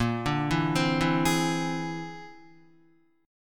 A sharp 9th Flat 5th A sharp, Guitar; A#9b5 arpeggiated; JGuitar

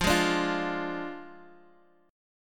F Minor 6th, Guitar, Open D; x,x,3,2,5,3; down; chord shape

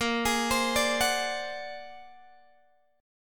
A sharp Augmented 9th A sharp Guitar Open G

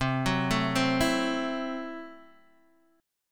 C 6th Flat 5th C down Guitar DADGAD

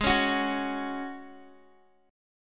Ab 7th Suspended 4th Ab Guitar DADGAD

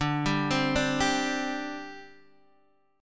D 7th Suspended 4th, down, Guitar, Half Step Down

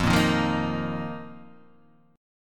F 7th Suspended 4th F; strummed; chord; F7sus4; JGuitar

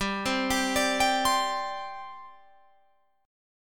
G Suspended 4th G down Guitar Open F; Gsus4 arpeggiated

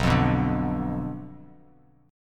B Diminished B down

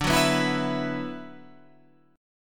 D 6th Add 9th D, Guitar, Half Step Down

